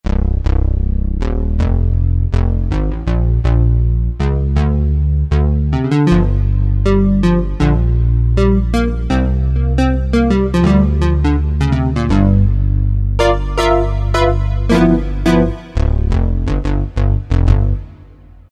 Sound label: Analog Bass, Korg M1; Factory preset examples